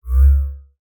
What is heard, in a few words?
wave swell; CrossCode; battle